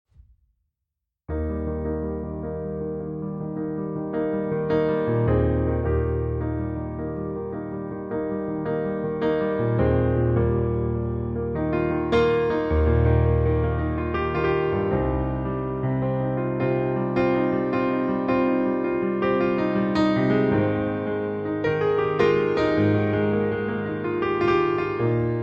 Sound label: Piano Version